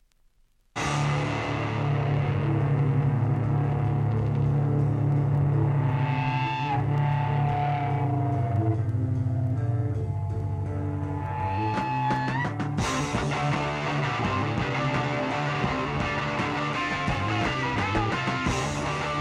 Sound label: instrumental